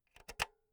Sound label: insert jack cable